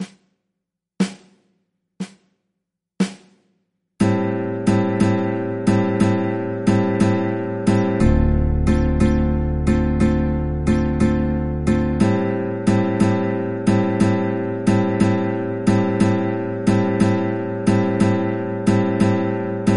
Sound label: chord progressions 12 bar slow blues A minor, guitar; This lesson will teach you progressions in several styles